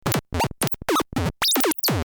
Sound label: bleep tone